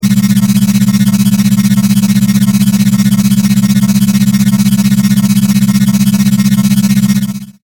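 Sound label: fizzle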